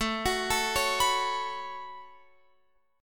A 6th Add 9th, Guitar, Open F; A6add9 arpeggiated; x,16,18,16,16,18

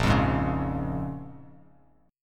Bb Minor 7th, Bbm7 chord; Bbm7 strummed

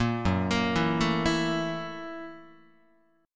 Gb 7th Sharp 9th Gb Guitar; arpeggiated; Gb7#9; JGuitar

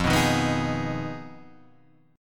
Ab Minor chord; Abm/F strummed

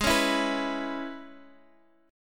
E 6th, Ab

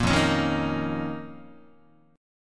F 7th Suspended 4th Sharp 5th F down Guitar; F7sus4#5; strummed; JGuitar

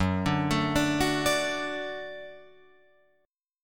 Gb Minor Sharp 5th Gb down Guitar Open F; Gbm#5; arpeggiated; JGuitar

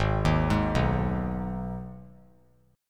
G sharp Minor G sharp down, chord; G#m arpeggiated; JGuitar

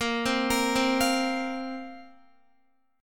A sharp Suspended 2nd down Guitar; A#sus2 arpeggiated

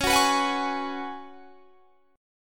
Db 7th Suspended 4th Db down; strummed

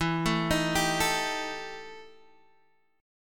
E Minor Major 7th Double Flat 5th, Guitar, Open D; chord shape

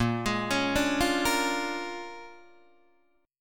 A sharp 7th Sharp 9th, Guitar, Open D

